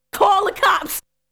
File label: Vocal; ZERO G - Datafile DISC 2